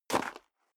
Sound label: step high heels gravel light single right; schritte; Footsteps; menschen